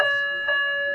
Los Angeles Metro Red and Purple lines: "ding-ding"